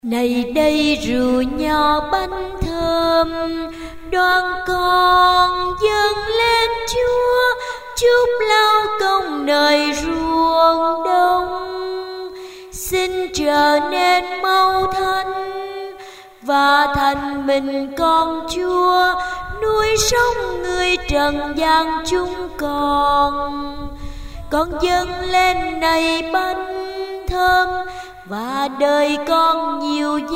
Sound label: alto